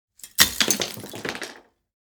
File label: Small debris thrown on a rock pile; impacts